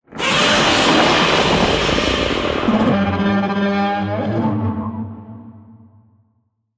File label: godzilla roar; godzilla 24 inch HTT